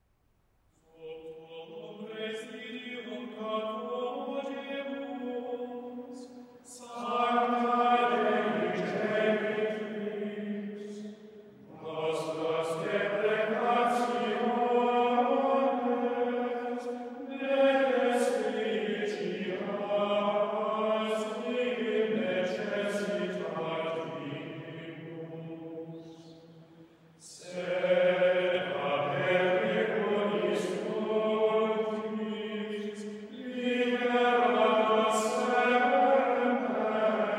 Gregorian Chant; Sub tuum praesidium

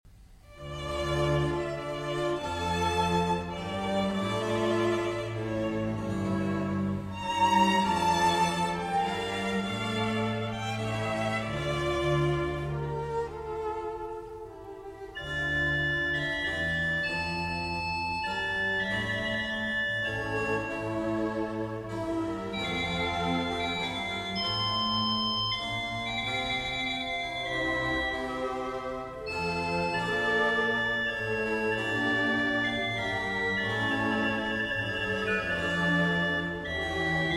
Organ Concerto, Orchestra; Simon Preston, Colin Tinley, Menuhin Festival Orchestra; Organ Concerto No. 5 in F, Op. 4

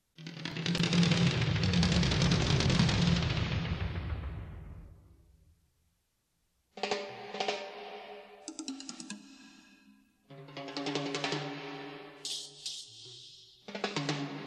Wild Vocals And Percussion; Rain Man

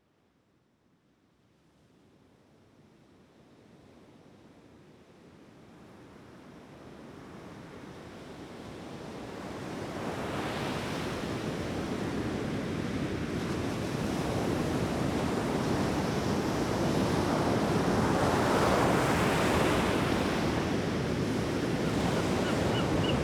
Pacific Surf & Songbirds

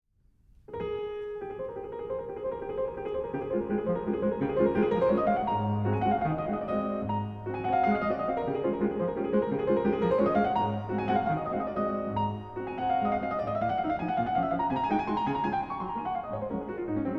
Waltz; Waltz in D flat Op.64 No.1 'Minute'; Paycheck

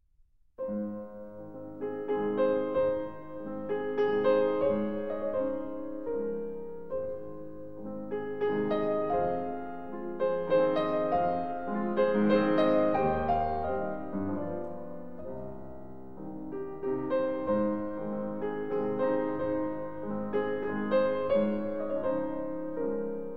Waltzes For Piano 4 Hands; Johannes Brahms

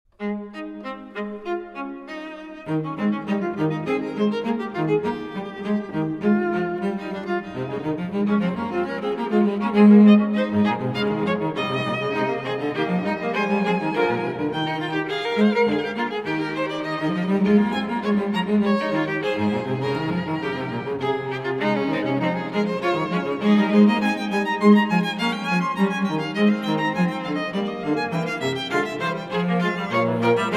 Fugue In A Flat Major, String Quartet; Emerson String Quartet; Das Wohltemperierte Klavier Book 1; Bwv 862

